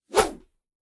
Swoosh Rod Pole; Just Whoosh 3; soundbits

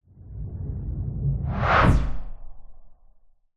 sound design whoosh fast pass impact boom; zapsplat

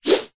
sport tennis racket swing swoosh whoosh air; zapsplat

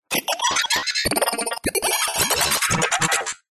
science fiction cyberpunk robotic glitches

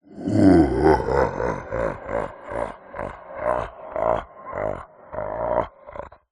horror evil laughter monster deep voice; zapsplat

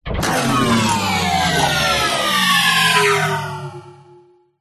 science fiction robot power down

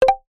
multimedia tone short wooden drop pin

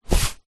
foley hat cap baseball put on head

